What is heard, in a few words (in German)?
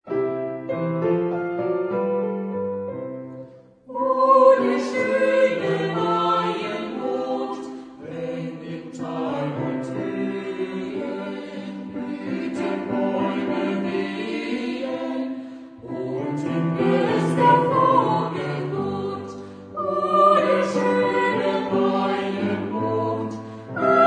Maillied Chor; johann abraham peter schulz